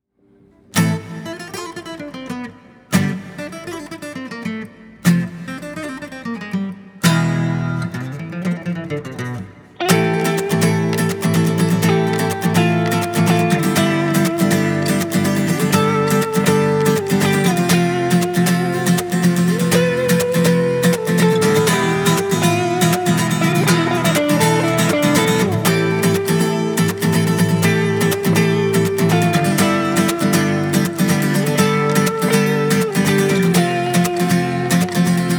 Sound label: Pink Flamenco